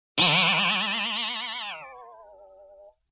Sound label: Boing Box